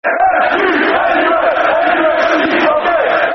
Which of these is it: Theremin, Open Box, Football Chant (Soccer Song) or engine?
Football Chant (Soccer Song)